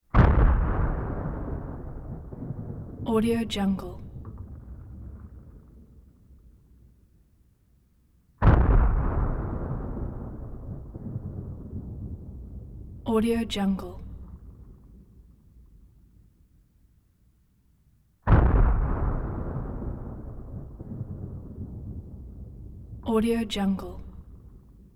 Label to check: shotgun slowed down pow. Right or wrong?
Right